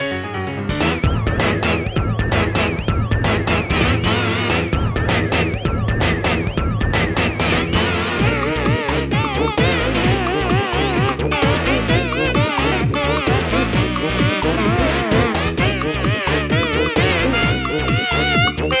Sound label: Battle Low Health; Mizzter Blizzard